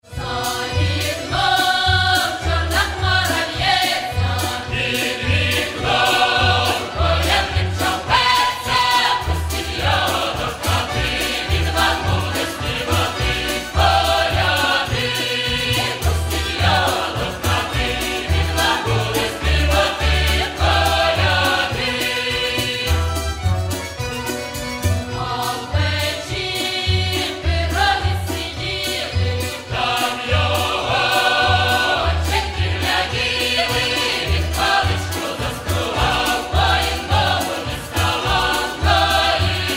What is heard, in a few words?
Folk Choir; Volyn